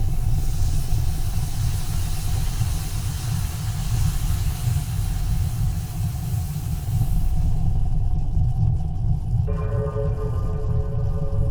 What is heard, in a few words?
ship science ship idle; Stellaris; machineage